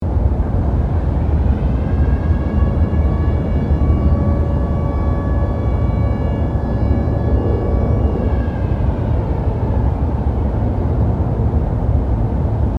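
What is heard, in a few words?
Ambience City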